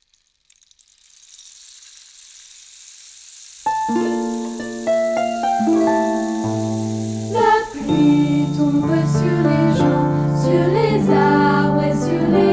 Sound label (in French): La pluie Version vocale